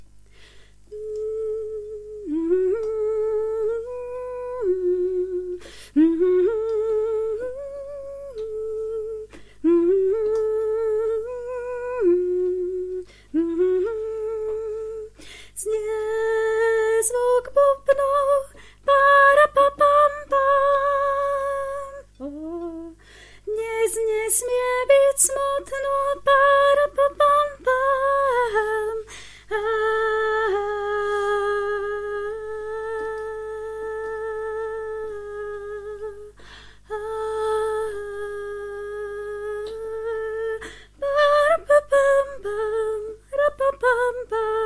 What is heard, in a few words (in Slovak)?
Tenor